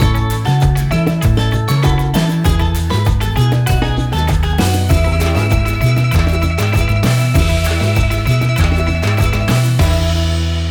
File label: Calypso Sunshine